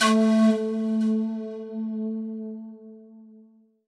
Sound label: ethno, sound ethno; Ueberschall; Techno Trance Essentials